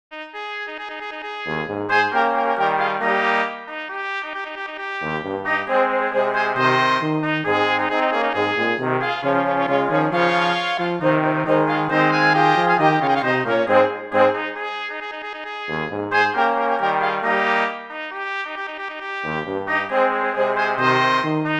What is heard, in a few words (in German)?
Polka